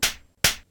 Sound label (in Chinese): 梆梆！; 环境音; 非循环音(SE); Eustia